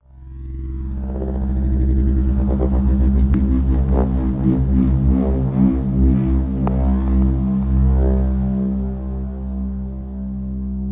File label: approaching spindown synth